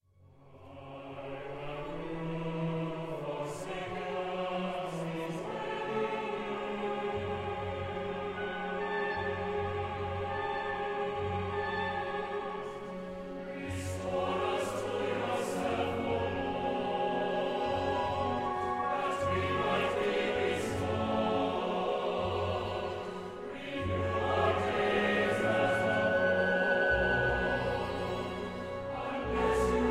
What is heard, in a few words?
Full Choir